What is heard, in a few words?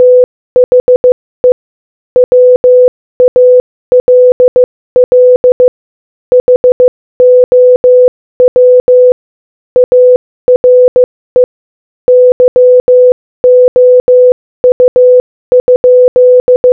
Morse Code Audio Speed 15 15